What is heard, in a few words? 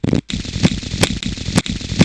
cards